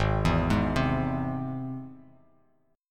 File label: Ab Augmented Ab; Ab+ arpeggiated; JGuitar